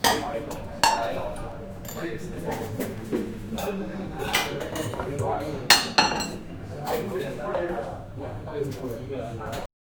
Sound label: five people both men, dining